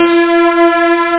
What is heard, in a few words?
piano strings